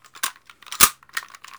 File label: Gun Handling Weapon, Weapons Foley; SFX Kit